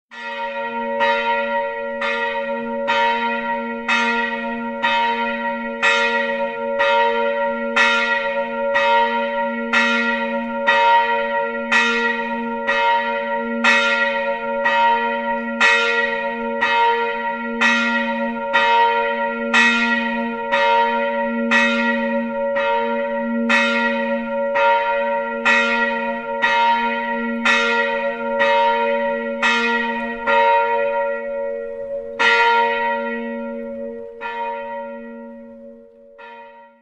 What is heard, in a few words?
church ringingfast, bell; building